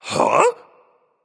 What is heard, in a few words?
confused, voicepack; smite; jorm